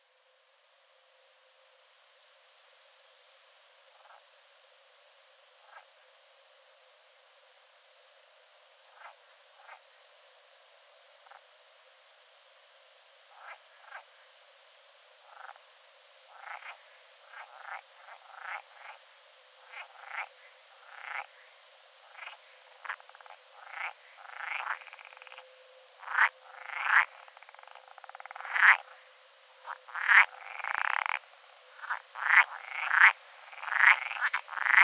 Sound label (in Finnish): Molysammakkoja, rauhanlinna; Kaarinan